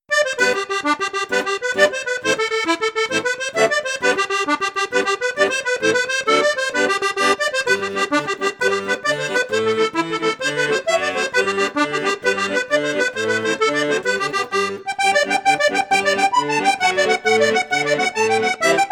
registre flute basse flute juste flute haute, accordeon; maugein festibal trio

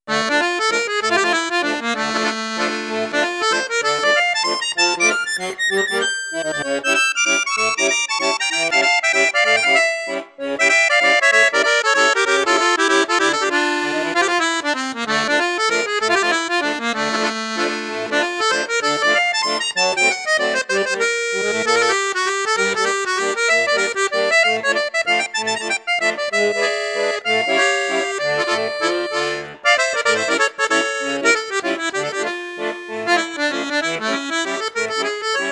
registre flute juste, accordéon; mengascini f4

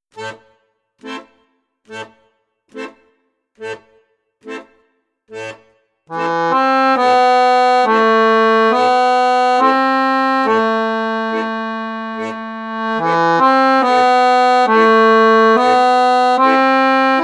registre basson, accordeon; crucianelli king

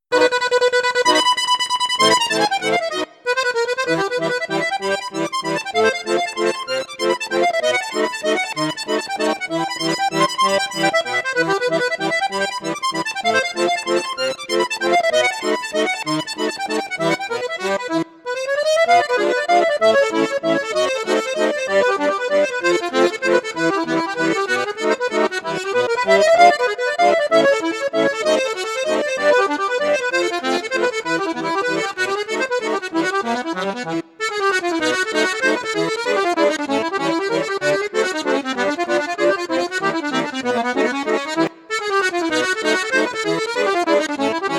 registre flute juste flute haute, accordeon; mengascini f5

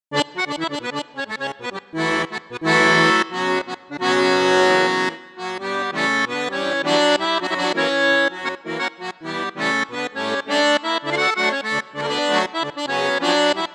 registre 16 8 8, accordeon; borsini concerto medio

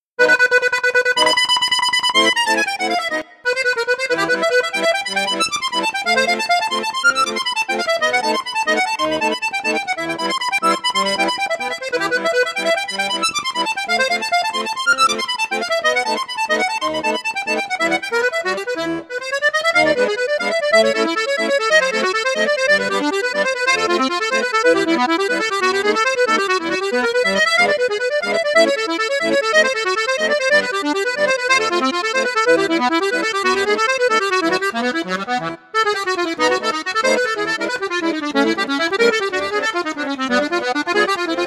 registre flute juste flute haute, accordeon; piermaria convertor

